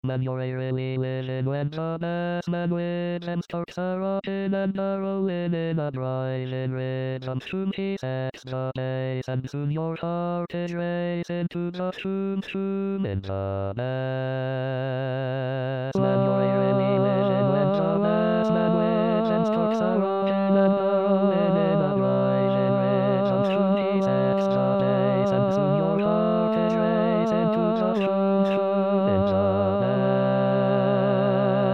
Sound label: Barbershop Calypso Tenor